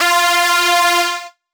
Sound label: Lead - F; Instrument Oneshots; 99Sounds; Monosounds